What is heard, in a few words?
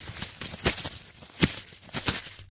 bandage; STALZONE